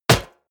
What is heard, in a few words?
hollow short medium, hits impacts; whooshes rises